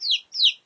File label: Chicken Scream; Farm Mania